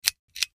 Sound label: Switches Pull Chain